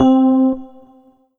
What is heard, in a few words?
Yamaha TG77 Tine Electric Piano C4